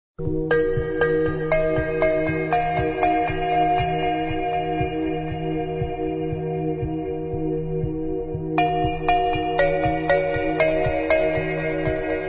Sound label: glockenspiel music